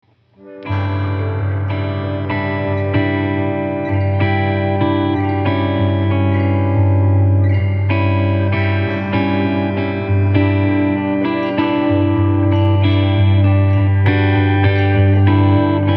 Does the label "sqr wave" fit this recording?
No